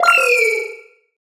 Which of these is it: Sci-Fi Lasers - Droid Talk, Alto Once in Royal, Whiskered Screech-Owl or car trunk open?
Sci-Fi Lasers - Droid Talk